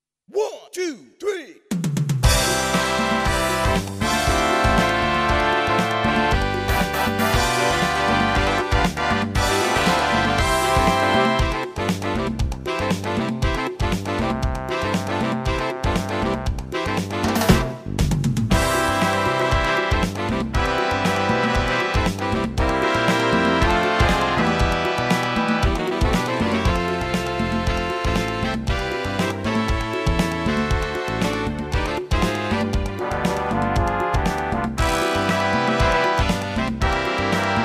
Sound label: JINGLE BELLS